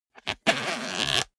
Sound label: zipper small case close